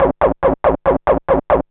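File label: SYNTH BASS DANCE 140 A; eJay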